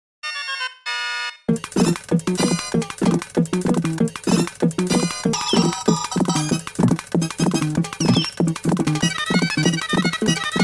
fight music

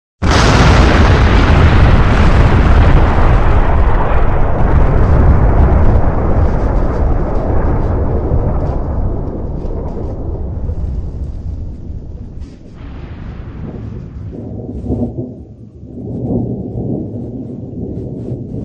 explosion

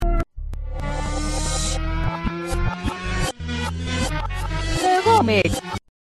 game over; reverse

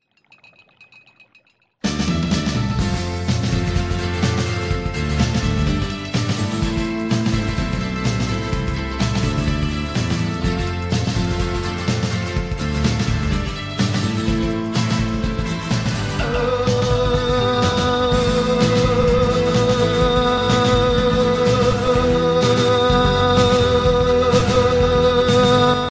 ooohhhhh; cave

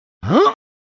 ungh; reverse

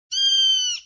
"ahh"

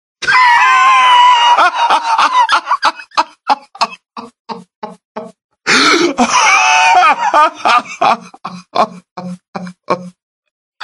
laugh

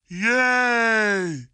yay

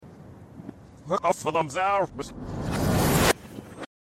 surprise; reverse

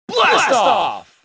blastoff; cave

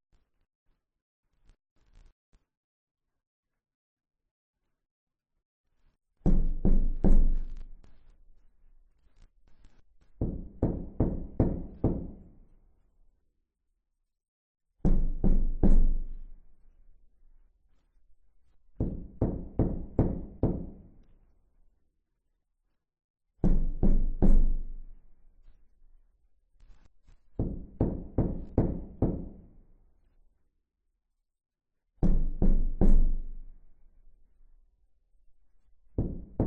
knock

